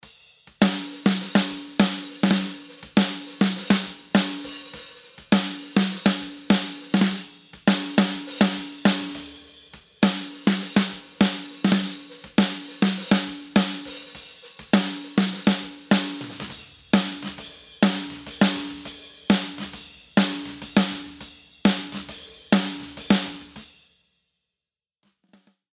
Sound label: snare before